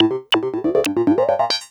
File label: TECHNO SYNTH 140; Keys